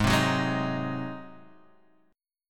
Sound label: G sharp 7th G sharp down Guitar Standard; G#7; strummed; JGuitar